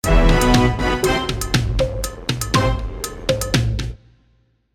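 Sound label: game background music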